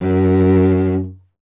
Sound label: STR VC F#0, Cello; Miroslav Vitous; Solo Instruments VOL-1